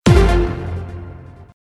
stops

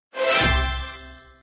total win

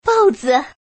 card type; redvsblack

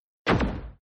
fast turn star

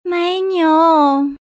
cow

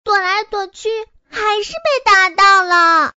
fish; yule

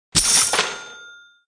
coin get; yule

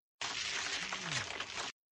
reel turn

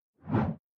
fast turn stop

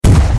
fire

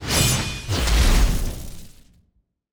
battle; redvsblack

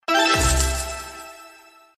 win more

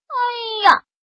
Monster Death; yule; fdfish

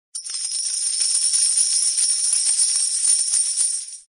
get gold; tiger

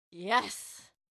Cheer

